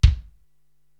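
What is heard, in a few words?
bass drum Dry, Korg TR-Rack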